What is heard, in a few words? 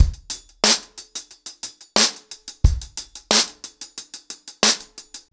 ACOUSTIC DRUMS, 90 BPM